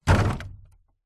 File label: industrial 10 litre plastic drum water heavy set down